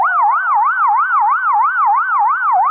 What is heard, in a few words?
siren, emergency vehicle; CosmicRage